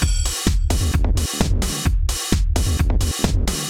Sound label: music; RapidTyping Tutor